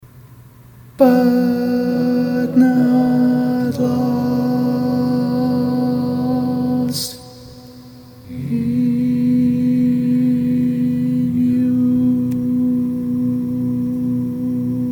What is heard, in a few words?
barbershop tags